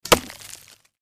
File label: Arrow Impact